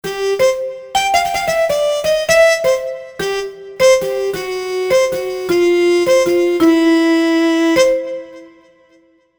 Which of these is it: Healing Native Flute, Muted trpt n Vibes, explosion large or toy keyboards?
Muted trpt n Vibes